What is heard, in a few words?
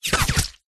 warfare bullets x3 hit body quick succession; zapsplat